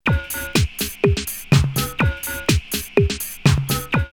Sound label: Warped 124, drumloops, Rave; X-Static Goldmine; 90 s Sample CDs